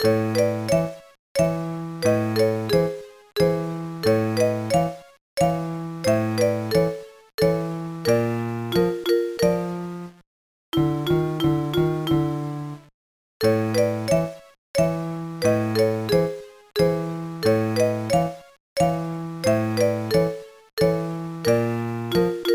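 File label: background music; No No No Puzzle Chailien; GBA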